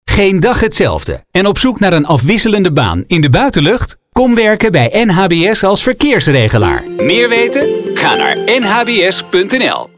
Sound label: Jingle